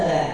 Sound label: dolorian damage